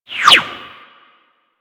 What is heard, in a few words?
Arch Skiff Bullet By; Grineer